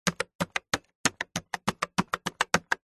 cash register till digital buttons press sequence; zapsplat

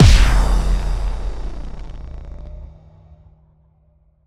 Impact Boom Distorted; bottlerocket

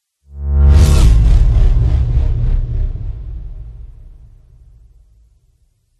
spaceship fly by; george gazetis

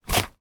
foley wallet credit card slide out close up; zapsplat

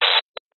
science fiction radio signal interference burst

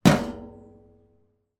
household bbq lid drum close

